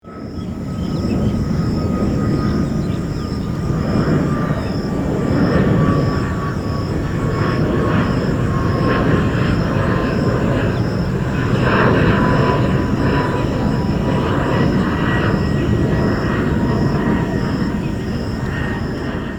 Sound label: big plane sound effect; tanweraman